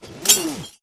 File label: zipline detach; Titanfall 2